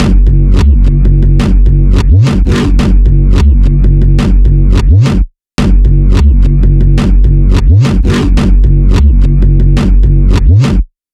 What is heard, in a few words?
Bass loop